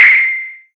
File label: PIPE HIT; Drum Hits